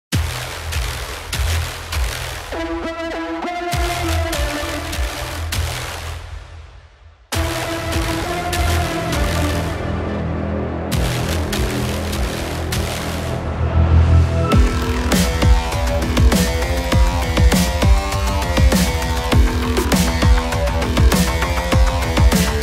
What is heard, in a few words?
Ascension (Ambience), soundtrack; Turbo Overkill